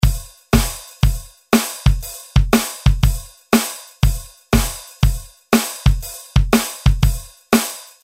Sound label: OLDIES2, Roland R-8